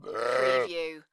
Animal Sheep Adult

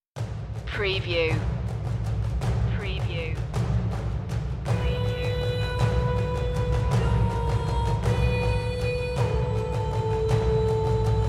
voice and perc only

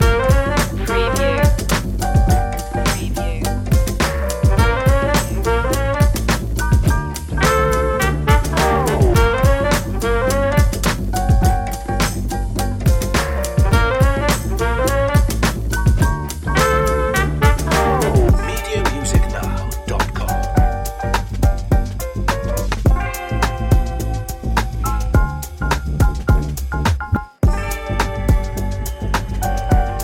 comical detective long looping

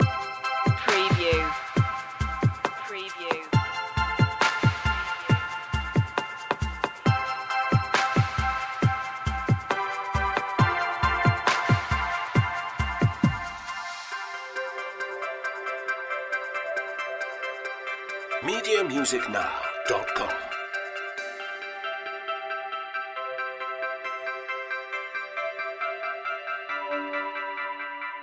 tones of aqua loop

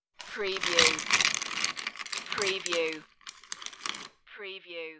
Ice Stir

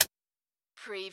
Helicopter Flip Switch Engage

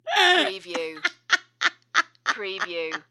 Lady Crazy Laugh

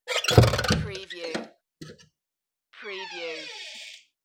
Door Open With Rattle Then Creak

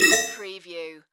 Multimedia Roll Over; sound-effects-bible

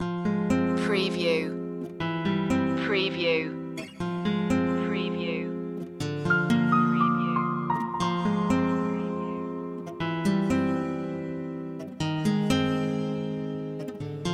cold summer nights loop

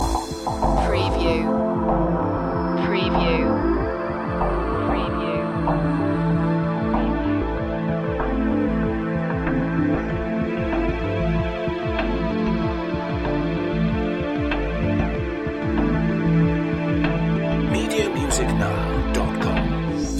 menhir loop

